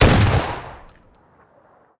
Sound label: Gun Sound; Gangstar; Gully Loops